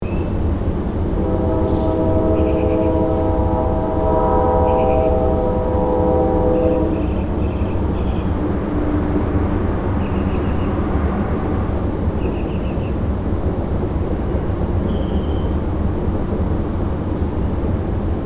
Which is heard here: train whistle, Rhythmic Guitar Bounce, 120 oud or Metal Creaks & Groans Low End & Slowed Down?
train whistle